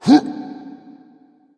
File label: grunt; Smite; bakasura